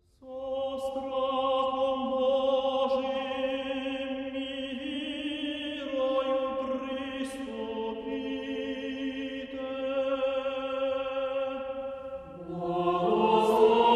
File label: Choir Liturhia; Kyiv